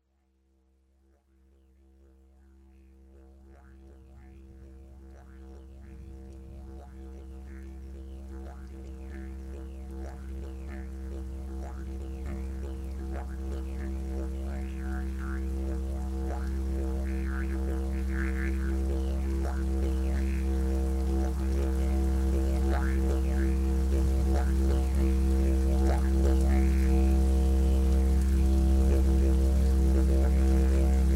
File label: Binaural Didgeridoo; 51m; Meditation; hybrid; Binaural Hybrids